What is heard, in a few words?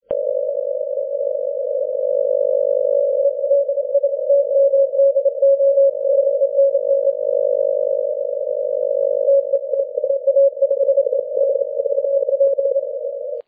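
30m continuous wave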